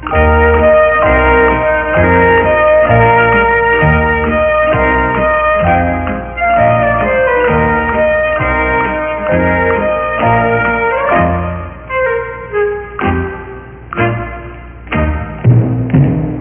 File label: Theme Song; SuperJeopardy! 5.0.2